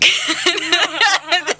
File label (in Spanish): risa